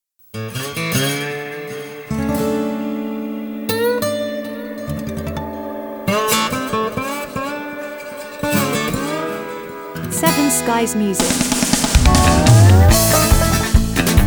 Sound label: Open Country Theme (no voice)